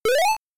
Jump; Metro Cross arcade

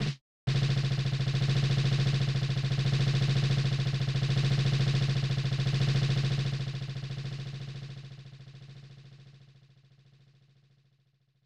Drum Roll; traverse starlight and prairie; snes

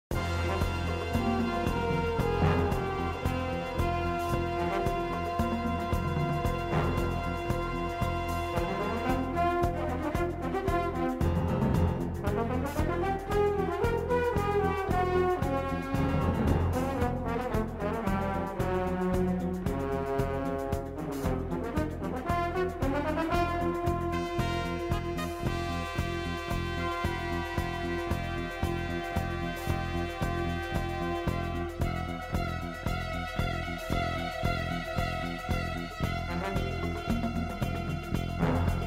BGM Ominous Surround; Family Guy Back to the Multiverse; Apm

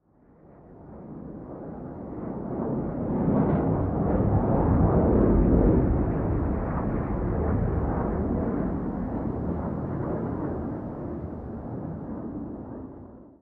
Vehicles Jet Distant; Counter-Strike Global Offensive; PS3 Windows Xbox 360

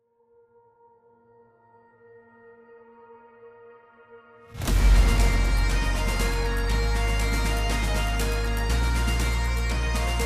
Character Select, Solo Mode; apex legends season 12; Horizon

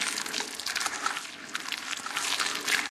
Tentacles Loop; The thing achievement

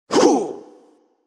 Damage (Voice); children of morta; Scorpion King

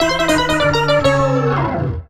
Fish Lost

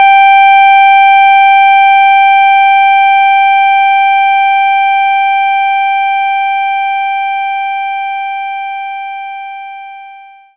clarinet G5

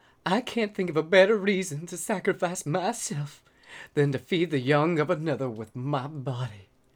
Male Reaction

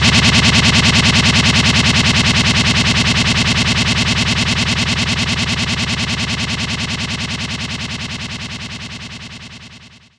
TR 909 Scratch Pull; knights try

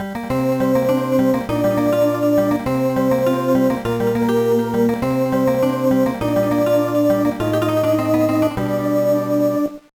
Jingle, soundtrack; tanbi musou meine liebe 2001 gba

